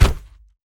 enemy death land hip; Aragami

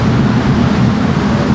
kill aerial idle loop; Aragami

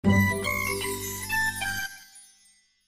Fanfare; Suburban Super Sports The Gumball Games

